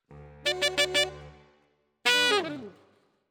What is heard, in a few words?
Alto, RNR for piano